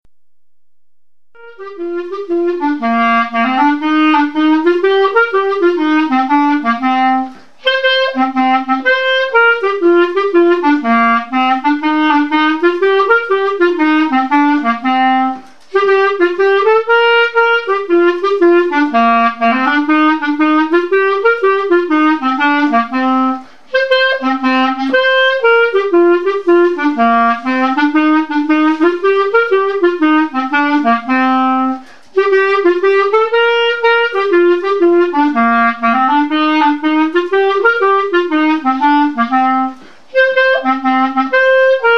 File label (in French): ballade irlandaise